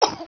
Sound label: pl pain, player